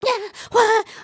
freak out, emotes

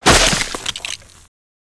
Crates n Boxes, destructibles

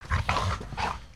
idle, creatures organic

